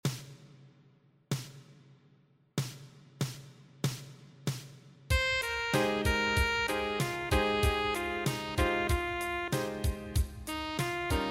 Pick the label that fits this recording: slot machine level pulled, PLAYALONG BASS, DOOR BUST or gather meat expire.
PLAYALONG BASS